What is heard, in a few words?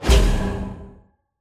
flash; pixelheros